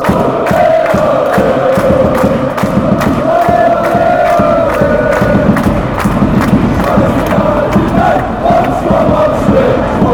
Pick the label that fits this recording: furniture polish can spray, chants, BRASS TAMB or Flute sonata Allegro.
chants